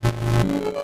Science Fiction Sci-Fi Electronic Distorted Beeps Ascending